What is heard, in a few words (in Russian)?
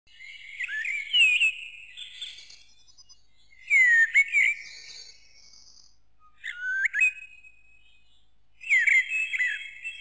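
deryaba golos, mistle thrush voice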